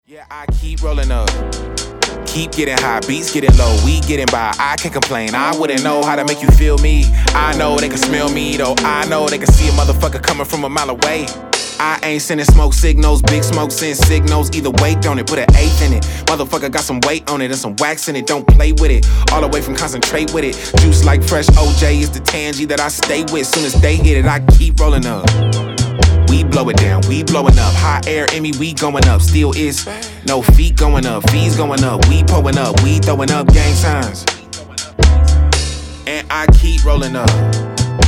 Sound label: HIP HOP